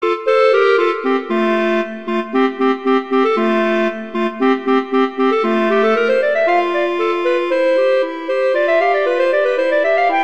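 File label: Duet, horn; Mozart Duets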